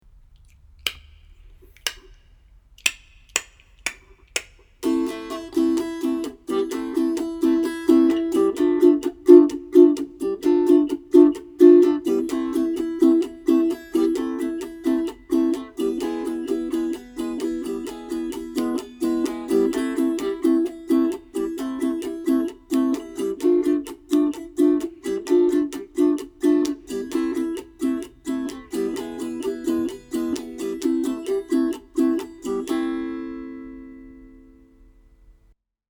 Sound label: A minor chords